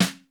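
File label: snare, 60s kits; 90 sSampleCDs; Crypton - DrumBase5000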